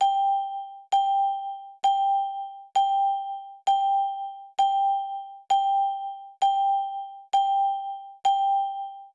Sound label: gong